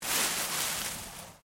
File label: LEAVES MOVE MINOR, Rustling; Material components for constructing more complex sounds; Rustling (Sound Effects:Objects)